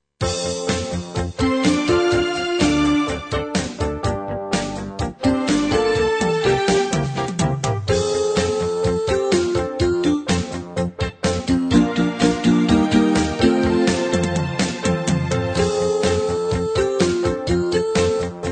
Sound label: solo; Colombia